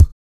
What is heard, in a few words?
BEATERBOY; Blues Soul; Spectrasonics Vocal Planet